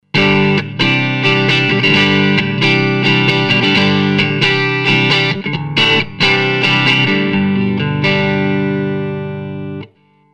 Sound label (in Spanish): 5 posiciones, Fender Strat